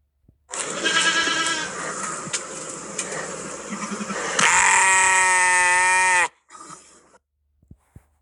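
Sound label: maaaaaaaaah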